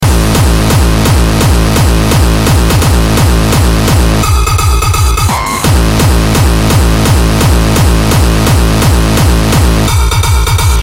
Hardstyle Beat

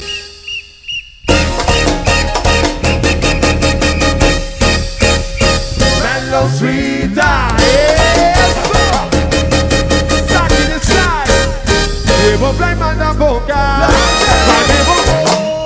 Fluit

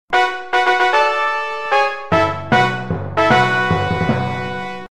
Mallets

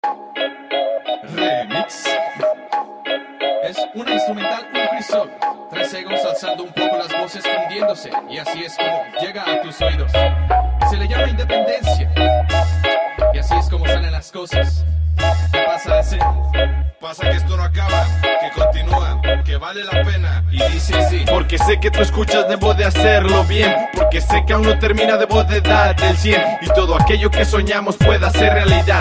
Hip Hop

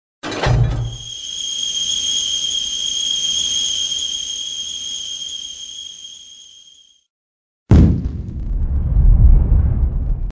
Small Bomb Drop

